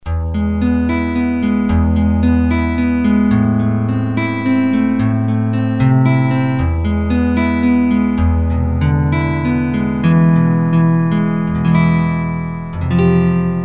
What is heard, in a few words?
Guitar